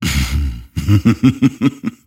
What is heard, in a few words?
grimstroke laugh; dota